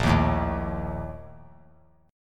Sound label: Bb Major 7th Suspended 4th, chord; strummed; JGuitar